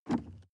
sports car int pedal gas release; verkehr transport; autos

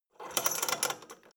drawbridge winch hoist metal; maschinen; metall; soundpool

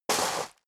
step leather shoes gravel medium slide short; schritte

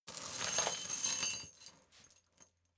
metal chain midsize handling jingle

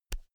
pull out usb c; objekte; elektronik

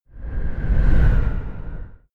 sci-fi vehicle train passing fast short; raumschiff

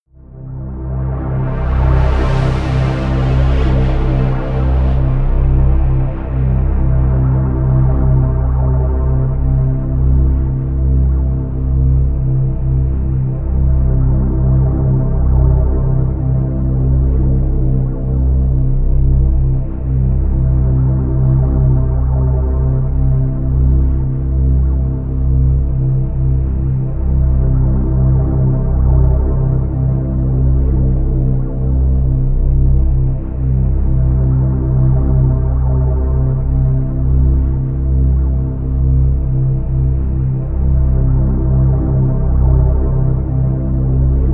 drone future

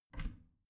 bathroom cabinet warped open soft

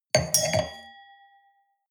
wine glass place table hard wobble; berufe; soundpool

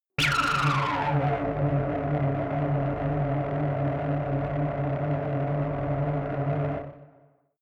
space shuttle warp arrive 70s; raumschiff

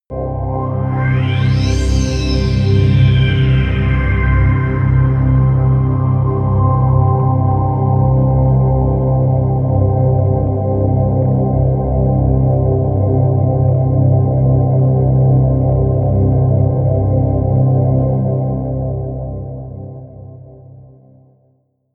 pad sweep intense; flaechen

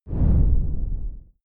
whoosh simple low short grainy; swooshes-swishes

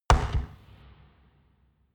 gun pop gun pop; schusswaffen

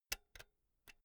pull out jack cable slow; objekte; elektronik

